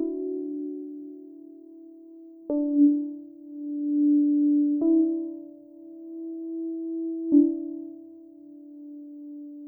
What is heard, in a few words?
synth 100bpm G; EPhemeral